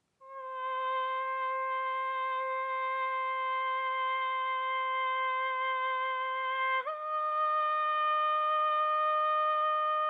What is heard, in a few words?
Cherubic Hymn Descant